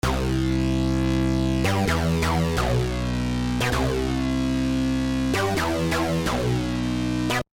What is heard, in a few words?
Acid Rebirth